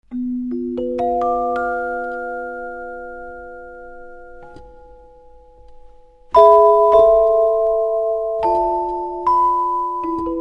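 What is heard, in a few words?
celesta; Челеста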